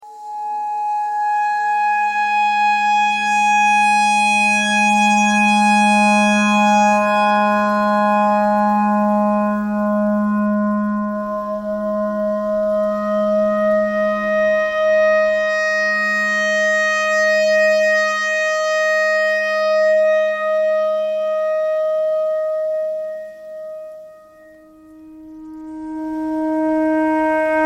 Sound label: clarinet clarinet notes e simple